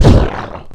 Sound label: pain; player